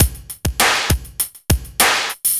BPM100 SYN

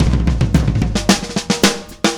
Drums Intro, Indie Rock; Sonic Foundry (Sony Creative Software); Indie Rock Anthems; Session 01 - Brighton

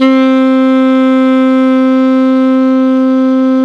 ALTO PP C 3, SAX; Greytsounds - Philip Wolfe David Crozier's Saxophones

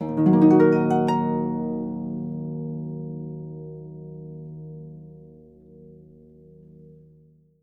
D#, Percussion & Harp; Miroslav Vitous

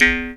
E JAW, Primitive Instruments; Big Fish Audio - Didgeridoo and Other Primitive Instruments; JAW SIMPLE

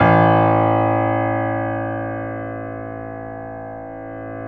PIA GRS, Roland; Sound & Vision; Gigapack I CD 2; PIA Grand 4

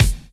BD; Best Service; Dance Mega Drums

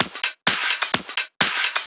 Clubhouse Loop 128 BPM; Best Service; House Definition VOL-1

